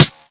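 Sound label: snare, Classic Hip Hop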